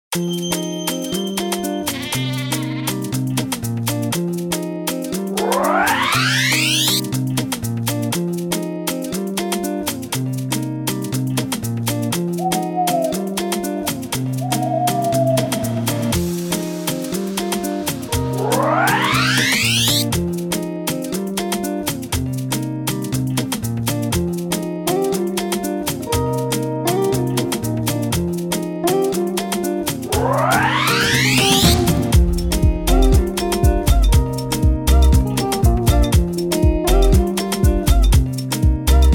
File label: Happy Children 120bpm